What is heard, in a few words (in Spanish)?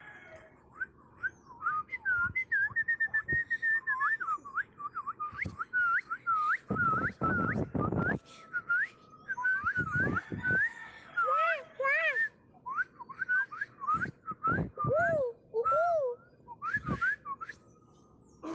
Pajaritos; FOLEYS